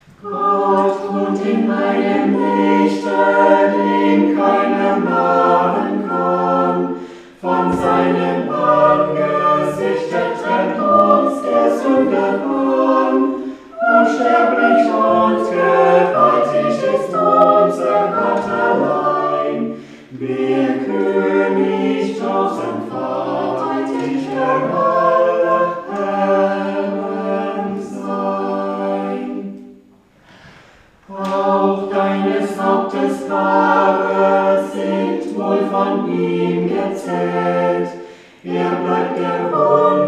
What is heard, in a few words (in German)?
Chor Gott wohnt; Gottesdienste zum Nachhören